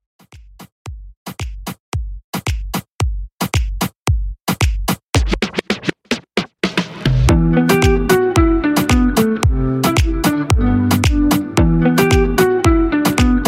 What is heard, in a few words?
Outro 112Bpm